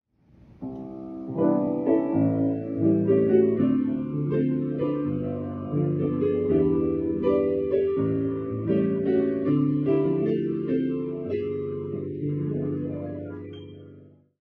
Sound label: piano ringtone